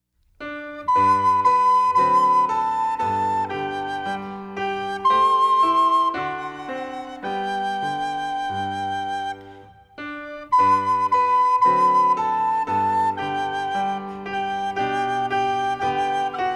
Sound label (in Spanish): musica solo